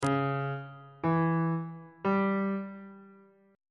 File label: f flat dorian chord 6 is d flat diminished on bass clef